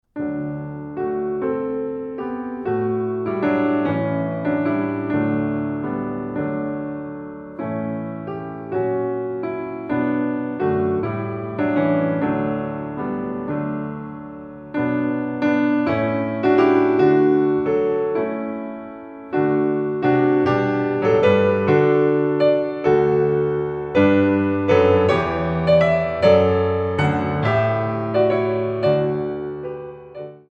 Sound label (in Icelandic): Orgel / píanó